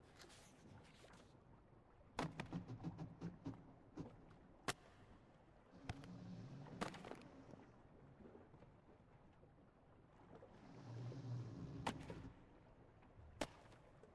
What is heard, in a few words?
AMB Sea Captain Room